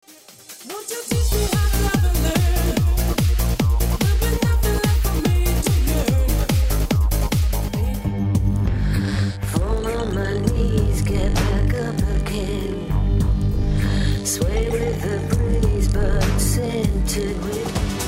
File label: Medley Electro Techno